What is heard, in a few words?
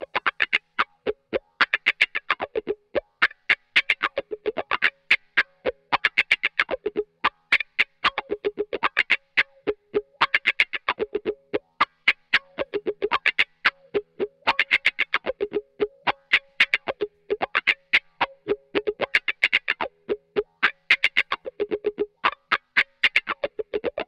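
112 Cm DISCO ELECTRO guitar, 112 BPM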